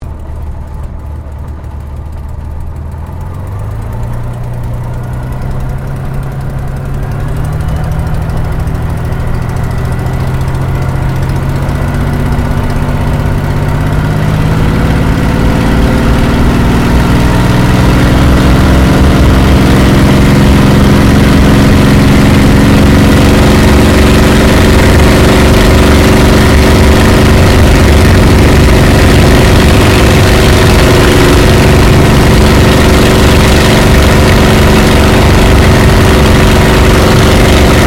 AIRCRAFT SUPERMARINE SPITFIRE MK-VIII TAKEOFF INTERIOR DISTORTION; Airplanes; Airplane Sound Effects